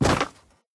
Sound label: wood fall; Golden Crayon Sounds